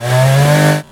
Chainsaw

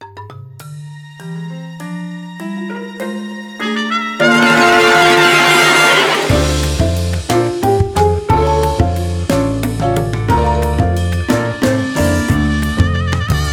Level Complete; kill it with fire heatwave android ios ps4 switch windows xbox one gamerip 2020

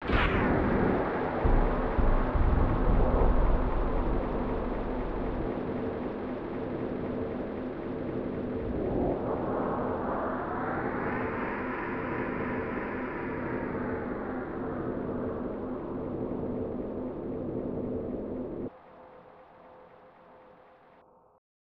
Unknown Ambience

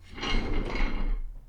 Office Chair Get On

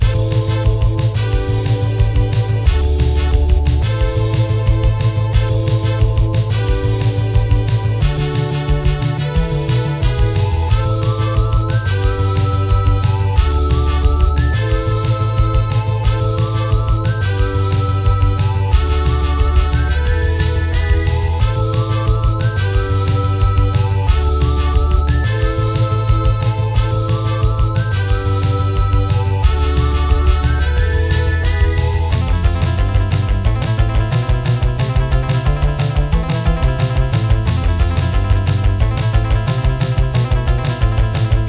Jackpot Collected, pinball; The X-Files Pinball

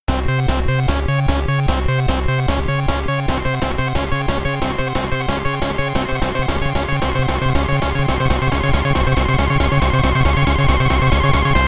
Drowning; Sonic the Hedgehog 1 & 2; 8-bit

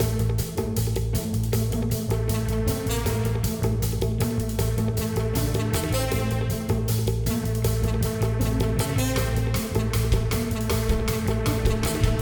Bee Hive Platforming MIXED

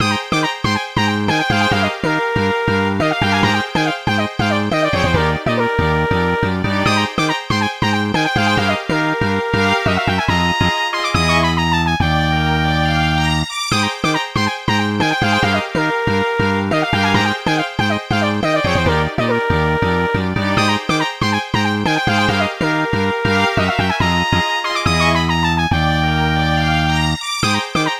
Invincibility Trumpet; Flowoooot

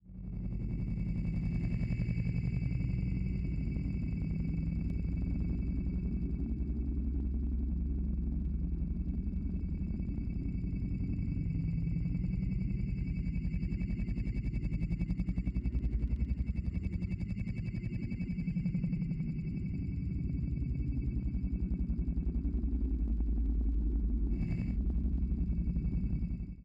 Drone Junkie Attack; get even gamerip 2017

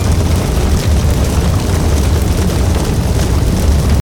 Loop Fire

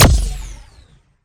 Sciweap Shot Medium Blaster Proton Rifle

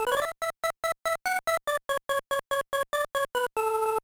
Jingle, gba; backyard baseball 2002

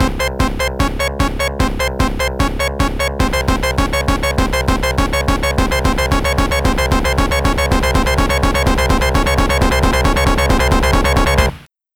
Jingle Timer; Metal Sonic Rebooted; genesis

